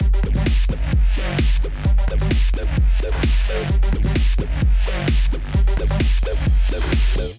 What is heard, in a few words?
big room kit groove 130 bpm